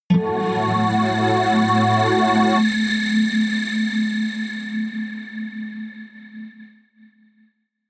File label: synth hit steam high; whooshes rises